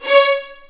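C#5, The Ultimate String Collection, violins marcato; East West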